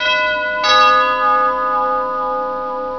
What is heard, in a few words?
ring